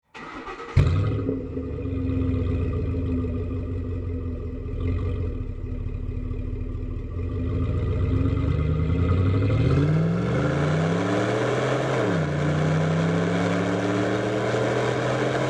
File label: Ford F-150 SVT Raptor